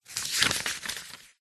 book large thick page turn; zapsplat

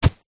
footstep single soccer boot run grass

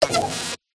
sparkly short glitch; PMSFX

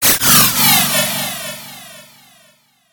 science fiction weapon or device power discharge; sound effects one

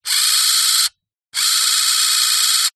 science fiction robot motor server movements

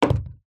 household book small place on table; zapsplat

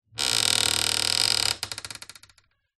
household antique larder door creak; zapsplat

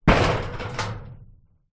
impact metal loose corrugated gate impact

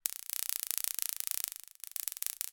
warfare taser gun

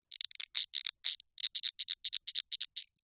plastic thin small pressure creak

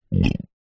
human male 40 years old deep stomach burp; zapsplat

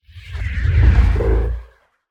Just Transitions SciFi; soundbits

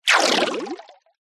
multimedia game designed water movement

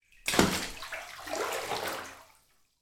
household bath limb splash water

